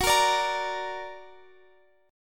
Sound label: D Augmented, chord